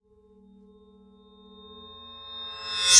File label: Swell Metallic Ring; bottlerocket